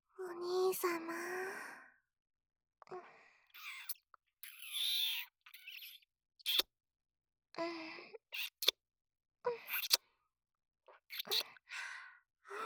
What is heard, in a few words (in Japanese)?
おまけボイス 大人のキスリピート